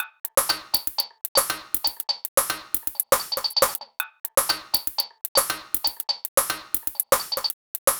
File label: Tinny Beat, Electronic Beats; Sonic Foundry (Sony Creative Software); Myths of Technology